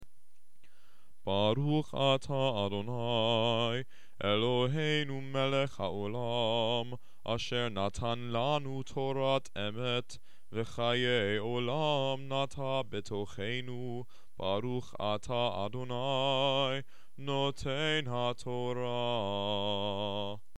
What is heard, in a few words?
blessing after the torah reading